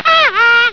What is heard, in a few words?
haha